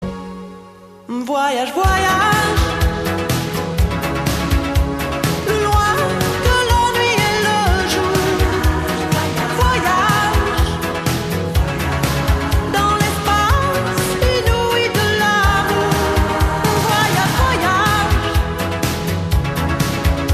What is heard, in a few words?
ringtone